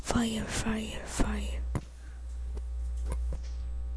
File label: fire